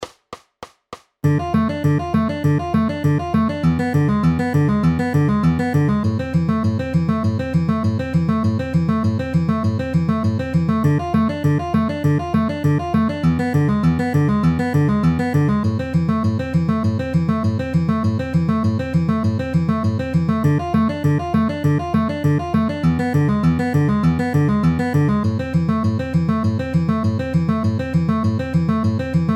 Dm E7 Am Am outer inner at 200 bpm

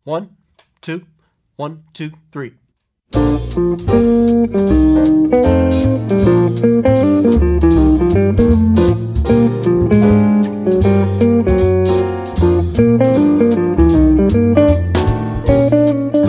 With Soloist

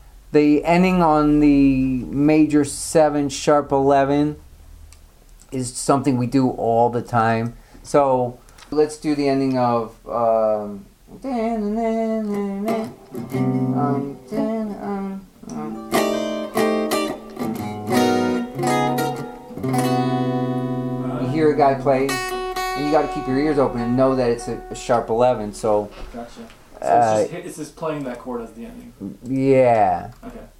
common endings

